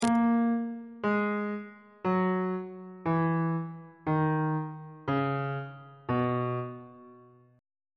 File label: c flat major scale descending on bass clef